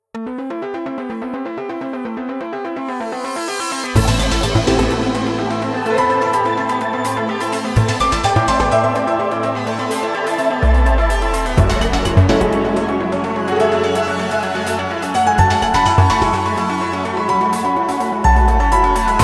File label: dungeon midnight; lostlab